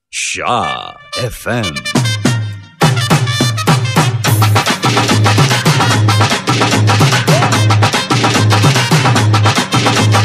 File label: Papare; Shaa FM Sindu Kamare Band Of Tournament